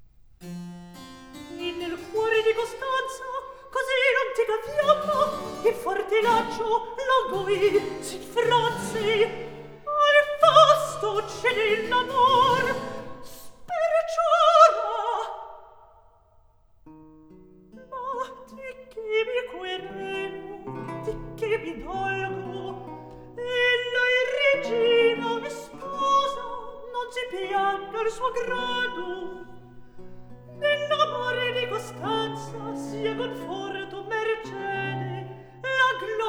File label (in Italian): Recitativo; Atto II; Scene 3; (Roberto); E nel cuor di Costanza